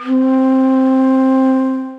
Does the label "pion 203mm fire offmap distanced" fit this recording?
No